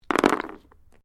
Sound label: Dice mixed game board throw; Casinos